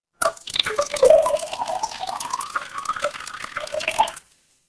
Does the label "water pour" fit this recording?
Yes